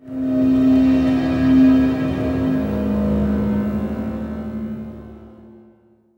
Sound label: Play Stinger